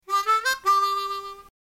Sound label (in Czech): harmonika